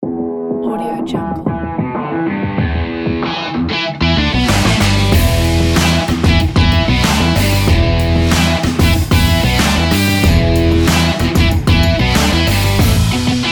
uplifting rock logo

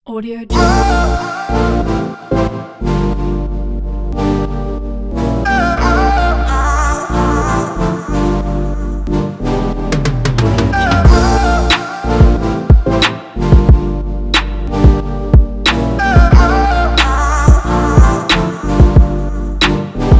fashion corporate future bass; whitestudio1

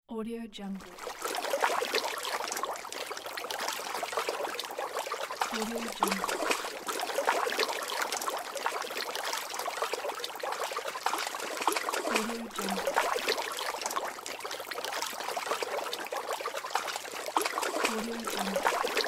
fish splashing in creek

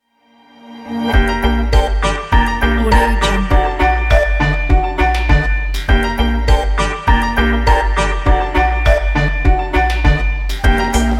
jazzy halloween

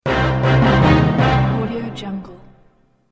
dramatic accent

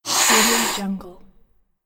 small monster hiss

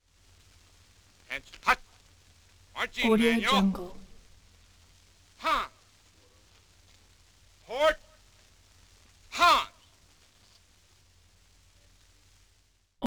troops train

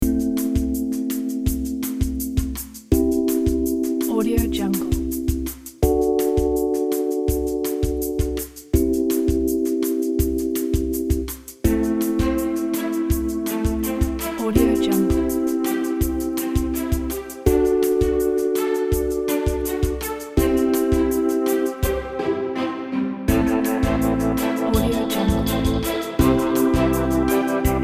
fashion girls commercial

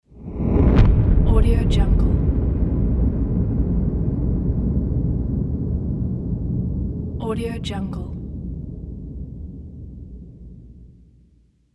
cinematic whoosh hit

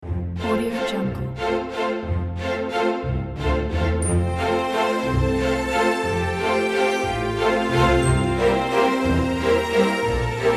orchestral waltz

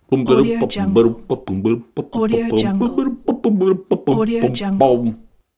man mumbling humming singing

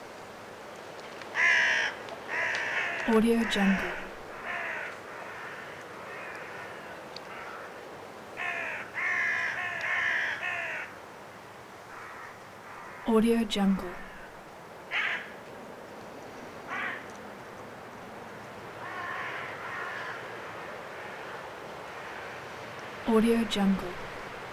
british storm crows; prosoundfx

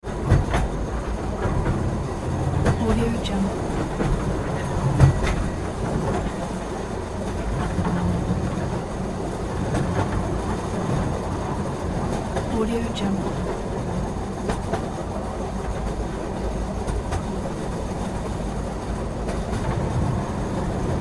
train moving

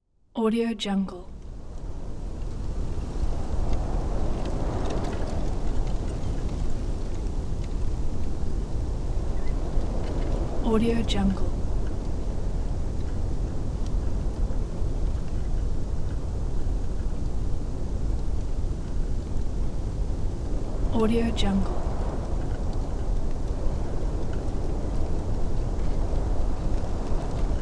soft wind; capeaudio

